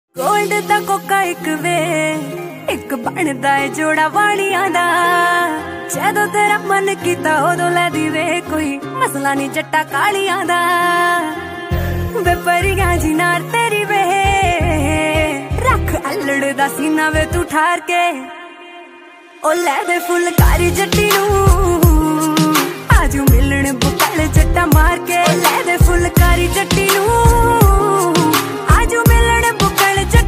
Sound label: Punjabi Song Ringtone